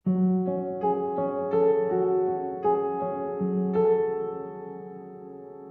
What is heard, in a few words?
piano, sad loop